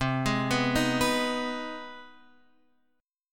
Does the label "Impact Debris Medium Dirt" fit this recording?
No